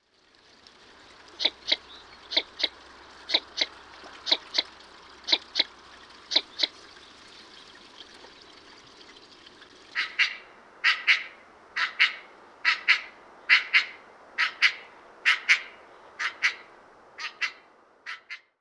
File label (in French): Canard souchet; sonotheque ornithologie